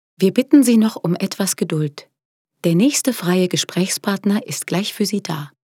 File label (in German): telefonpaket warteschleife